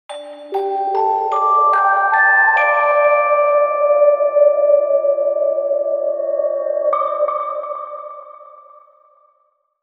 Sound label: scary little bells